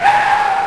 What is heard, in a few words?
Car - Skid